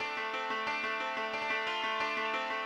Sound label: ethno, 90, D